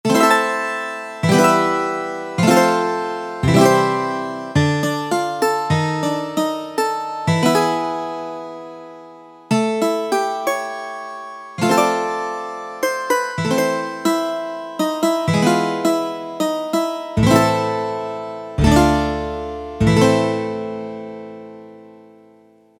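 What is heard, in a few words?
ethnic Lute; E-mu Proteus FX; Factory Presets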